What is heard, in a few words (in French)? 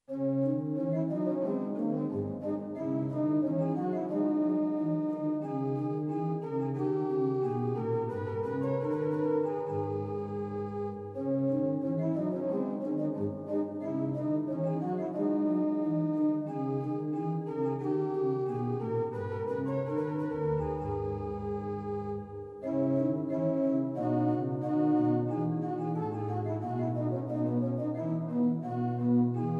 orgue; Reducciones